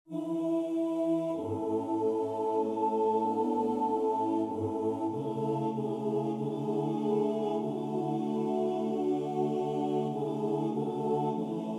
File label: mijn land midi; ons musyckboexken 2016